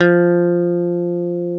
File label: Electric Guitar F3; soundbank; instruments